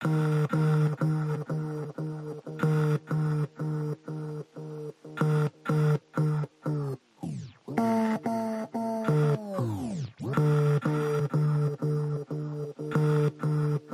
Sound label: Keys and Pulses Only